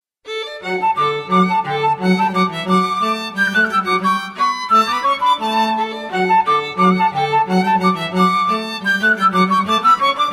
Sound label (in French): Scottisch - Italie; Scottisch di Masserano